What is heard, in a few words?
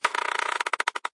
wheel